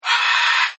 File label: Parrot Screech, Birds; Sound Effects:Animals